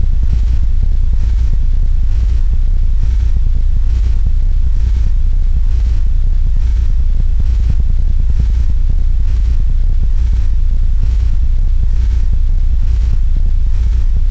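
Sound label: rumble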